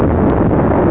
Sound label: rumble